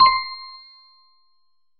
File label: notification